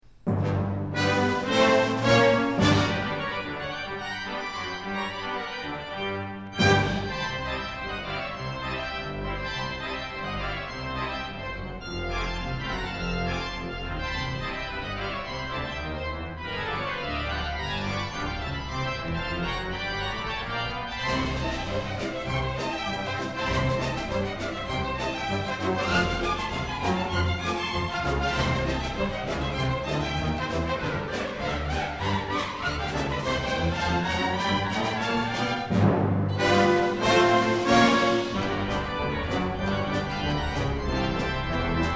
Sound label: Hot Pipes